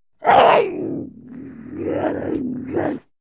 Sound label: Bobcat Snarl, Menacing. Breathy Inhale. Close Perspective.